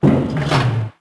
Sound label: drape stop; Max Payne; penthouse